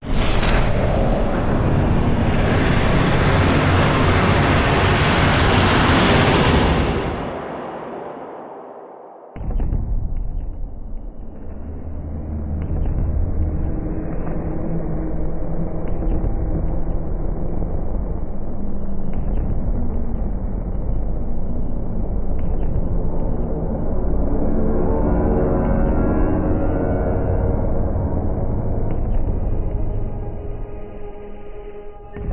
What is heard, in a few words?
The Other Side Ambience